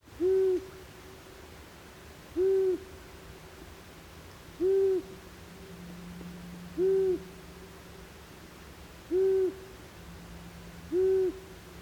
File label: asio otus